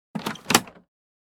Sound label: Airplane interior overhead bin open; vehicle